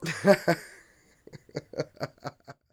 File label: LAUGH